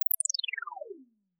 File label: Wacky tones, Synth